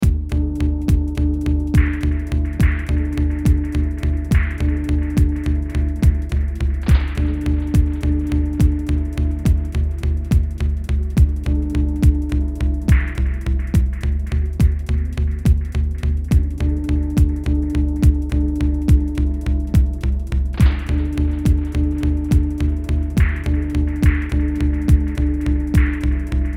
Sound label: 70 bpm zarb; beat pour mcee